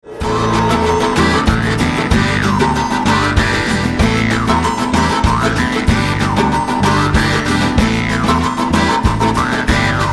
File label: vargan; instruments